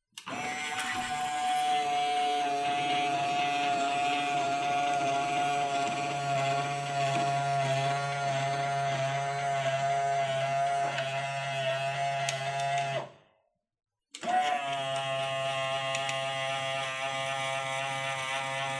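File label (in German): dachfenster elektrisch öffnen mit kettenantrieb; micro s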